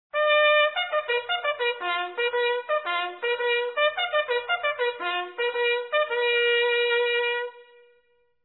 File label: Mens Meal (2nd Call), bugle calls